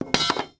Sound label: pipe drop wood; objects; Star Wars Obi-Wan; XBOX